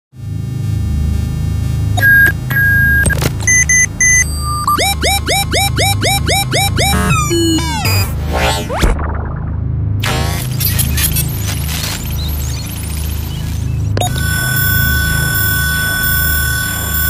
Computer User Interface, interface alerts

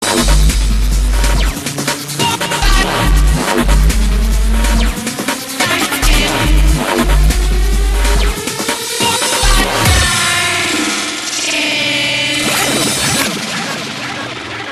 Popping Beats, percussive pops

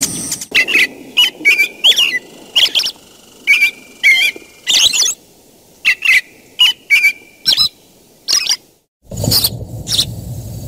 American Bird, nature chirps

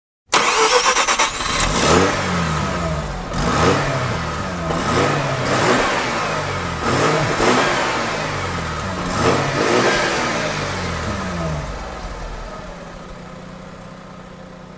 Mazda RX7 FD3S, car engine

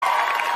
Audience Cheering

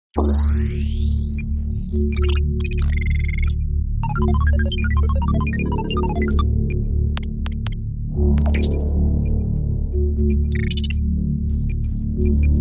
High Vibes, interface alerts

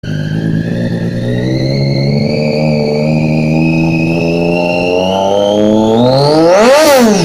Daytona 675 Arrow, engine roars

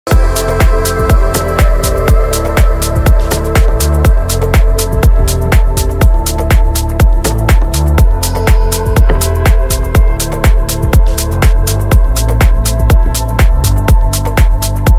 Whispering Waves, dance electronic groove

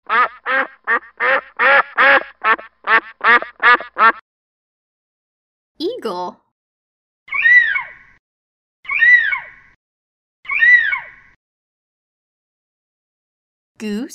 Cardinal, nature chirps

